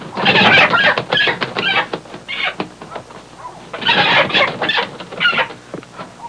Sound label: Hens fighting